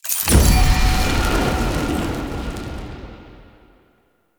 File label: Vendor Buff Menu Open; Tau; Circles Of Hell